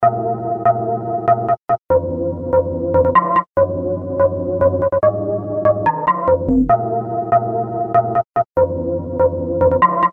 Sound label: Hollow Bar Bass, Percussion; Industrial